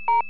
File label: click; PC Gamer IT CD 41; machines demo